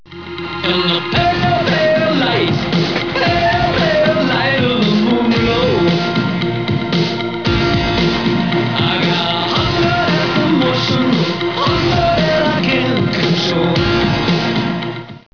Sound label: song